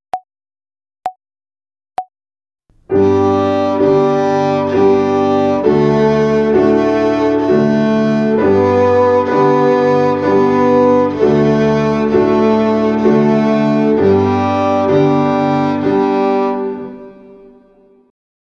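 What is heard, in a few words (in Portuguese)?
Ginástica até o dedo 2 - Corda SOL - Ternária, Violino; Violino para os Pequeninos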